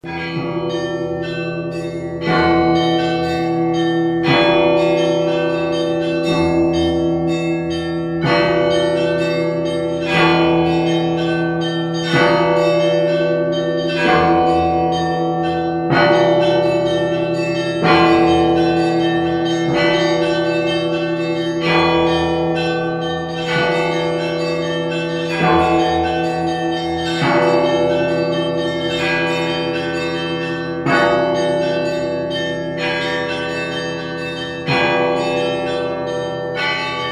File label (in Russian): obihodnyj zvon